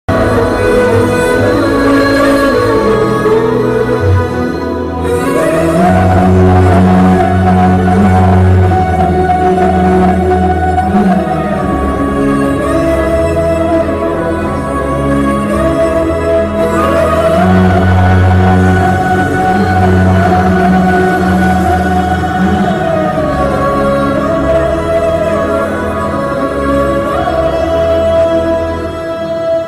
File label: climax sad ringtone; amaran; sai pallavi